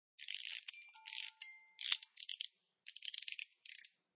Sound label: Cranking up a small music box; Winding Up Music Box; Toy Melody Sound Effects; Sound Effects:Toys